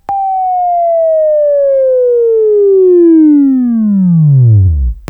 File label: WAV Right, Sweeping; Labview-Program; Sweeping Training program